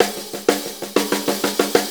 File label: Blues Fill; Sonic Foundry (Sony Creative Software); Loops & Samples Drum Tools; Fills